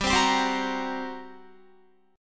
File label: G Minor Major 7th Double Flat 5th, G, Guitar; GmM7bb5; strummed